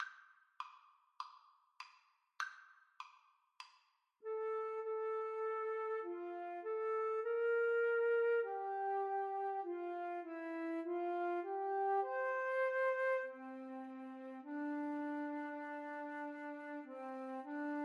Flute duet

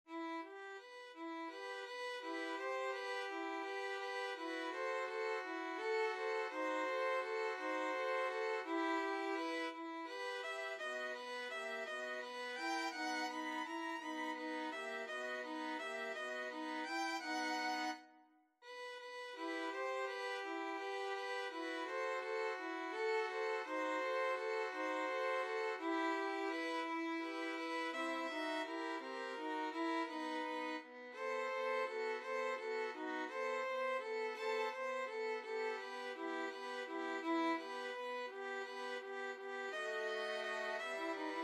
violin trio; lho perduta

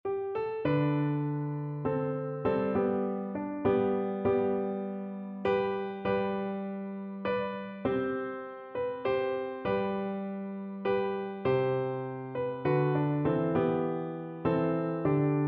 Oboe

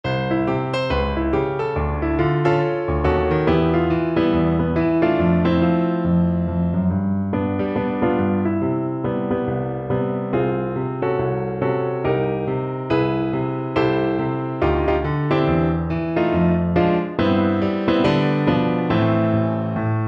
jump blues joy to the world FL kar, Flute

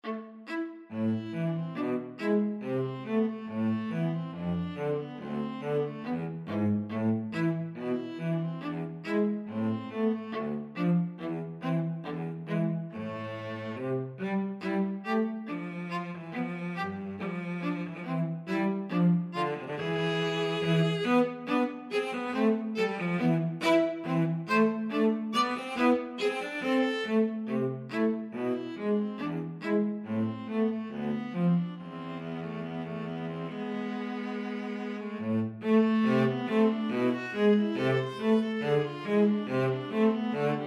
gavotte, viola cello duet; lully

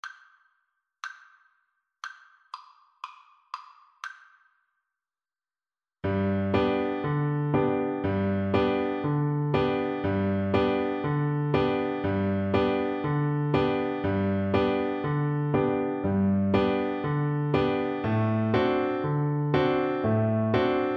when the saints, oboe

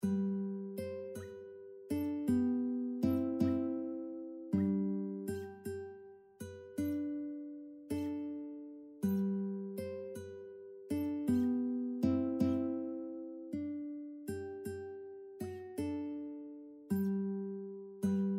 jesus keep me near uke

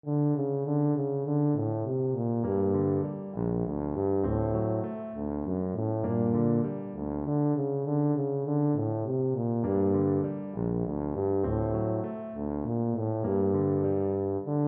fur elise, tuba

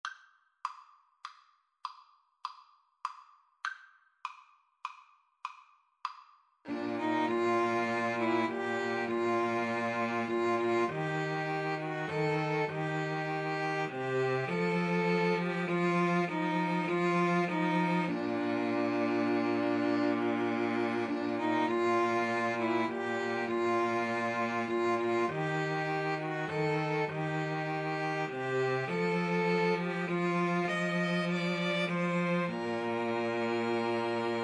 2 Violins Cello

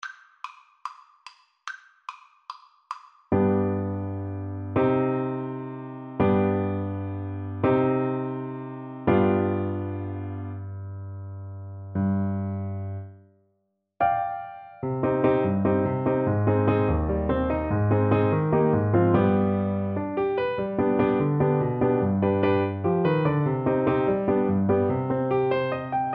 Zenska Siptarska Igra, Clarinet

